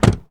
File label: Truck Dodge Ram Door Close; Trucks